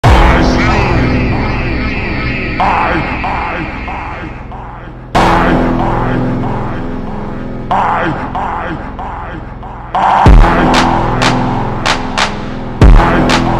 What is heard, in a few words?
super slowed reverb brazilian phonk